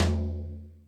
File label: Toms, Drum Machines E-MU Pro-Cussion